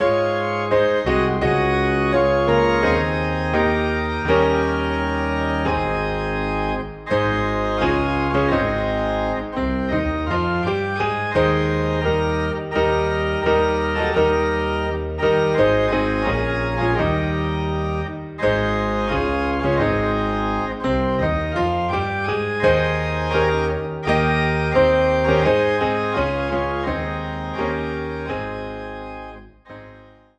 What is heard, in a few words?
Piano and Organ in A Flat